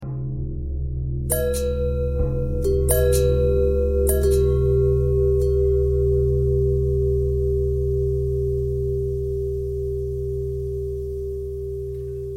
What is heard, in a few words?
long bell bell thread simple, bells